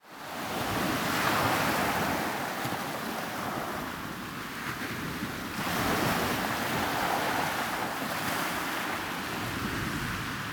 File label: sea stone beach; best service Blue Box; sea ocean